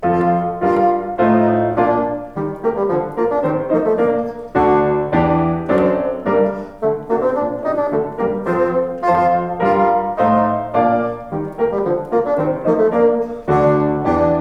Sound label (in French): Sonate pour Bassoon avec accompt de Piano; op. 168; Camille Saint Saens